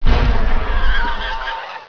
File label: DIE; Virus